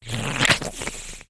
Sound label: goblin attack